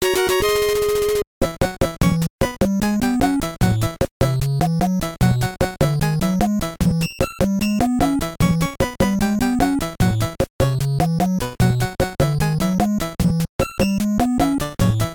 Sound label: Game Over; DM; NES; VS